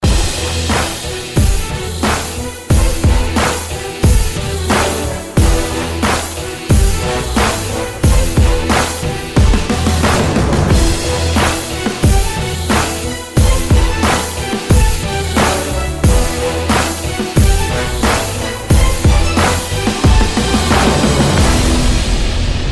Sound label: Jazzy